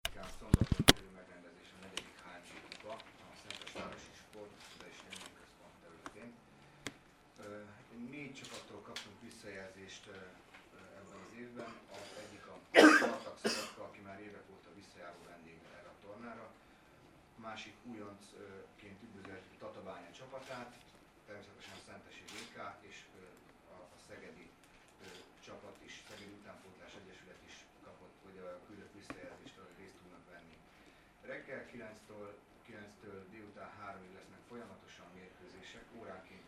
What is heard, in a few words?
digitar